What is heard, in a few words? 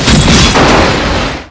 hit hard